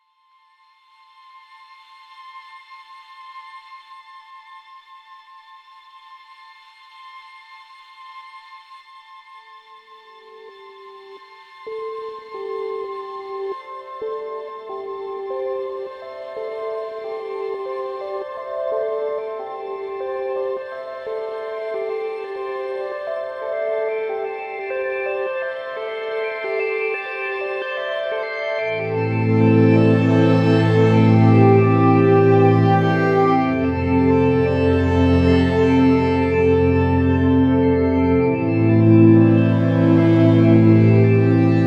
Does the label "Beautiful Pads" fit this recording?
Yes